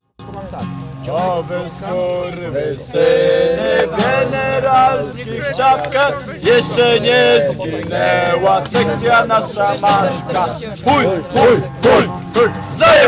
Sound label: Polka